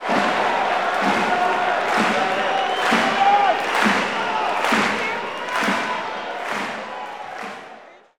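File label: Basketball Crowd Chant; Crowds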